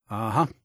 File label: "Uh huh"